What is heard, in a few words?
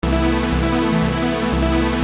Arpeggio 120 C, Techno Synth Loops; Sonic Foundry (Sony Creative Software); Signals I